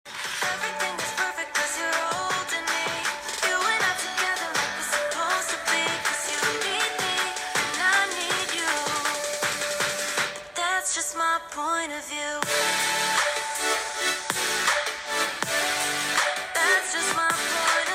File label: ZenFone 9 Speaker